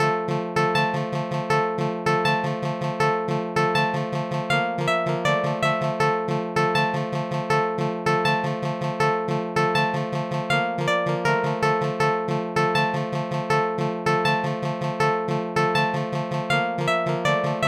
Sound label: field battle